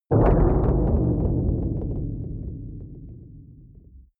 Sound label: protective shield hit absorption rattle; energie